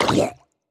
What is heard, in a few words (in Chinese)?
溺尸水中受伤声